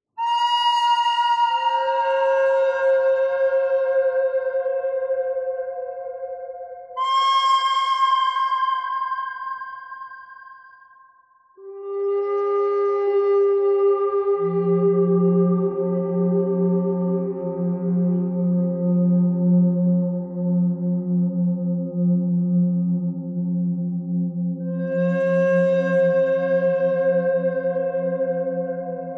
bird thread simple

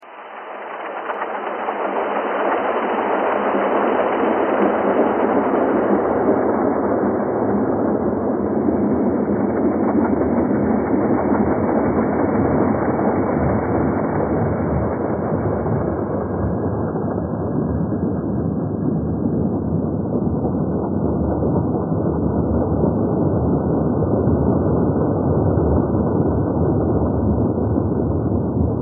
thread pentatonic

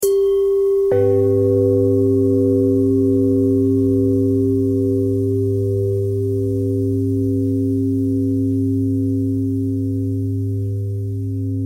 bell thread pentatonic; bells

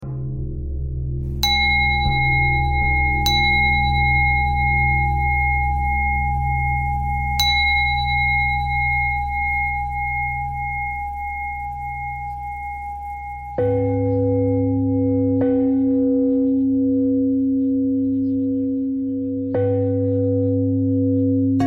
bell thread pentatonic

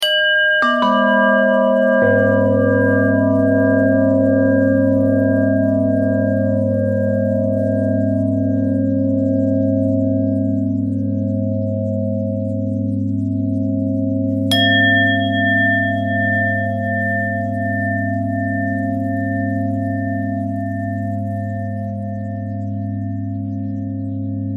bell thread

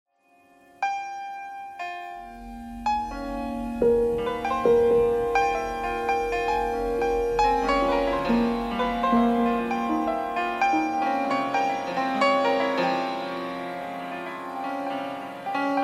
piano noise

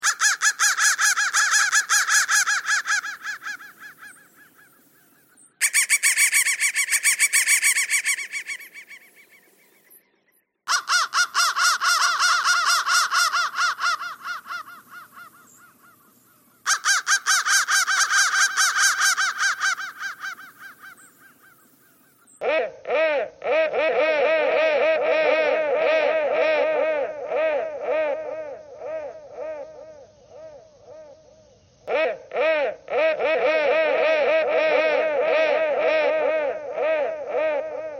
crow crow threads

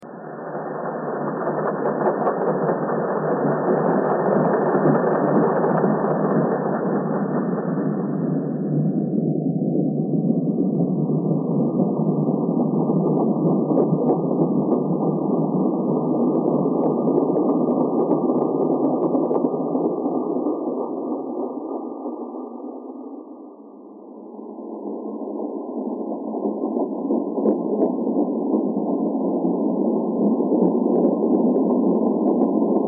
thread noise

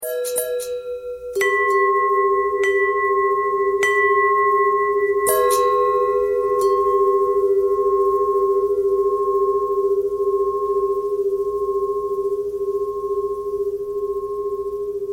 long bell bend threads; bells

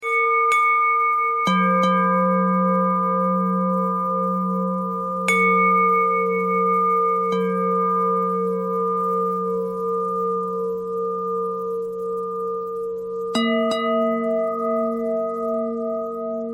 bell thread bend thread

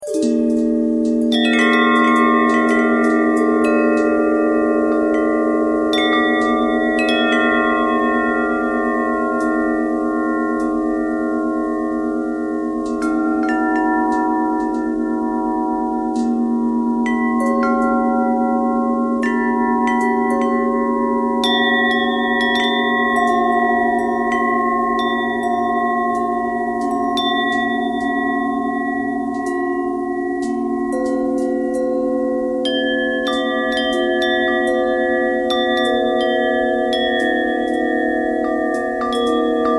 bell bell thread noise, bells

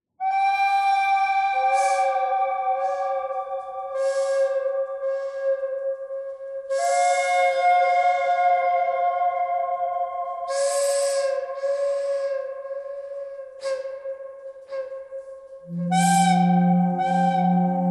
bird canyon bird thread buzz

